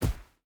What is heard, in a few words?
footstep hide sand jump land; Rust